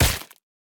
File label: Squished Crush; boomerang fu